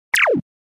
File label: Arcade 8 bit shot laser gun